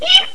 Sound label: eek; Catch the Bunny!